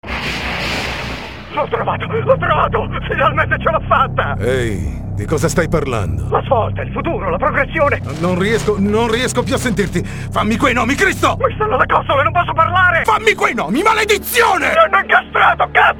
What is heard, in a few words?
jingle stereo